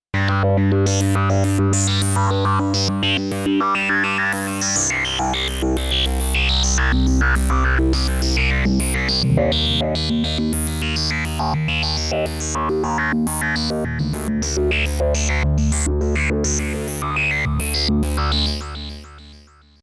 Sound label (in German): T-6 Reso Arp mit Delay und Phaser